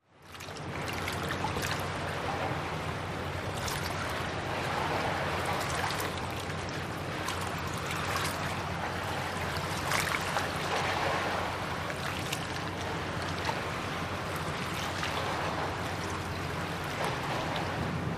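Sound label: Harbor Ambience Water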